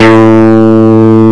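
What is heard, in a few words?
60s Rock Guitar, Light overdrive guitar A2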